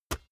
basketball single hand catch gentle

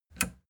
plastic button latch push disable

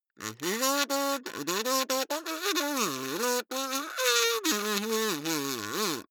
kazoo talk pratte

